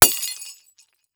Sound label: Light Bulb Smash